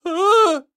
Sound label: histeric short; donnie; Blind Drive